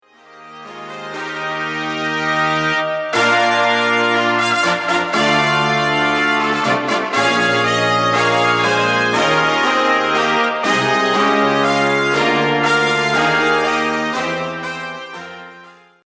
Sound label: brass